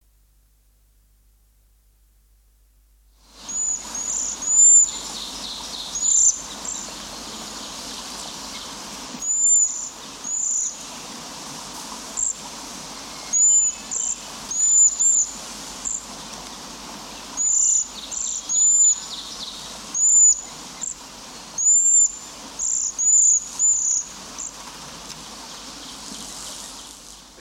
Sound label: cedar waxwing, songbirds